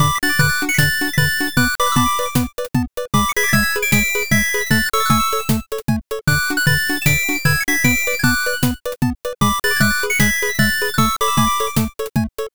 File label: bgm bright